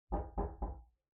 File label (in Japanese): 扉をノックされる音